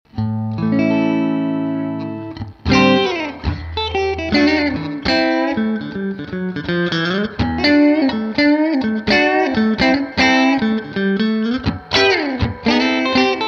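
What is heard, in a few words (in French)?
Neck - Bridge en hors phase, micros, strat-like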